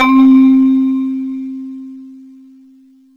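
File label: MARIM, percussion; AKAI S-Series CD-ROM Sound Library VOL-8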